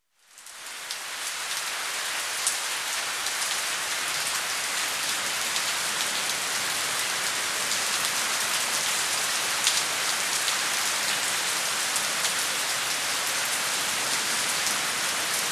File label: Rain